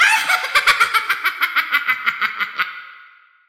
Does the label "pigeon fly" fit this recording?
No